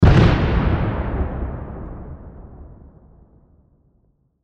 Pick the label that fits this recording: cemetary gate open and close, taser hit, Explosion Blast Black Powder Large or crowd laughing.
Explosion Blast Black Powder Large